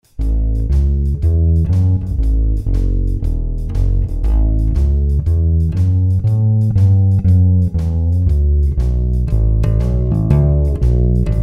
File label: bass